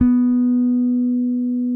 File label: P BASS SUSTN MP B3; Sampleheads; Will Lee Bass Library